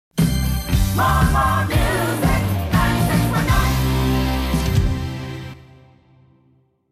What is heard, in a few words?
Jingle, radio